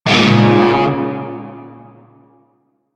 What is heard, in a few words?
Shawzin Yo Chord J; Music; Warframe; Narmer Shawzin